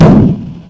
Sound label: pain; openffa; devastator